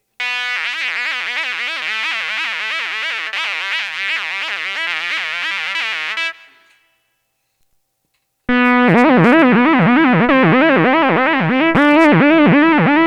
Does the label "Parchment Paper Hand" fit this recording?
No